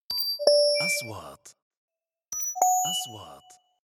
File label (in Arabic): نغمة نقره الكترونية, transitions